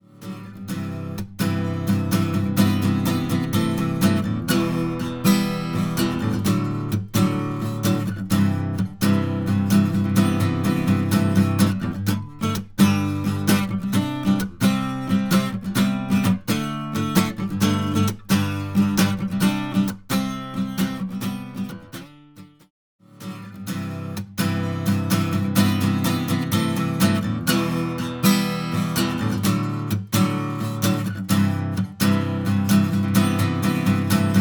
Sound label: acoustic raw eq comp; audio examples from the book